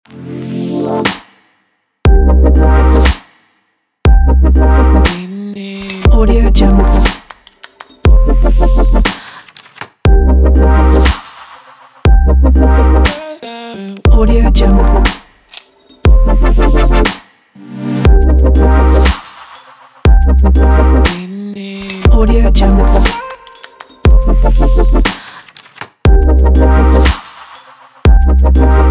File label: future fashion abstract